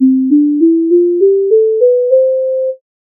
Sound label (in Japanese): 管楽器